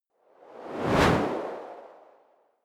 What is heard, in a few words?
whoosh simple hard high